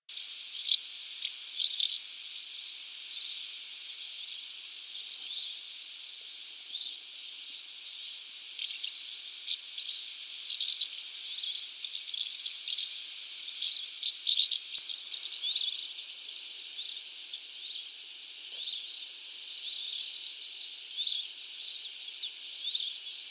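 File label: voronok golos, house martin voice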